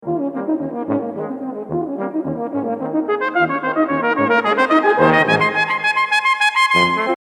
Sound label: Waltz; Brahms